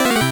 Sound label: 8bit se